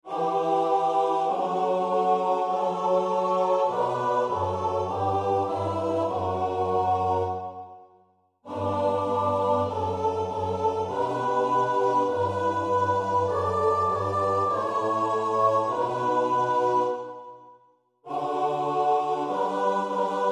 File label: Psalm 63 (S-A-T-B)